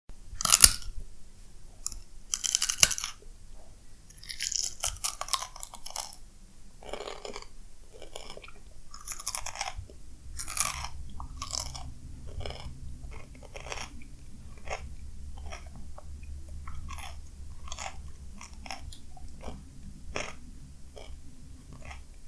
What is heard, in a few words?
Eating cucumber